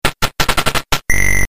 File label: arcade credit